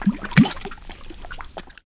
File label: bubbl